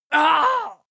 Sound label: male groan deep pain short; menschen; schreie